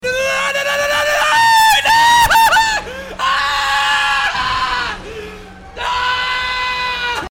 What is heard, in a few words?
shreik